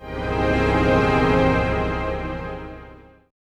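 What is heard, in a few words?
jazz chord, pads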